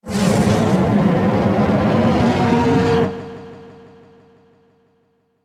Dragon; Yu-Gi-Oh! Master Duel

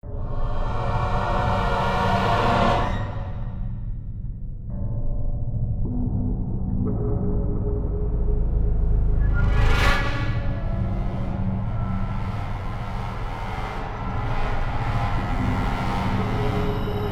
crawler suspense; F.E.A.R. 2: Project Origin

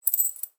Coins

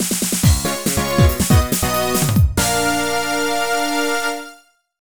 Jingle Win; Harvest Moon: Frantic Farming; NDS

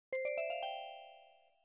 Gateopen; Snake III

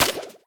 Gameplay Wpn Harpoon Shot; Zombie Catchers

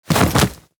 Fight Die; mighty party 2017 gamerip pc ios android

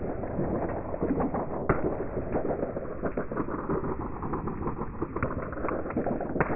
Lavaf; Buggy; PS1

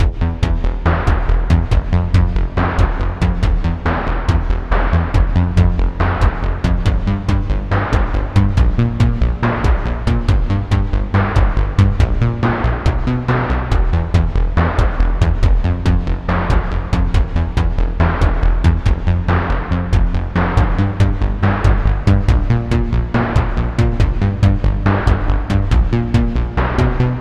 Battle Sequence; mass effect 3 citadel gamerip 2013; Arena

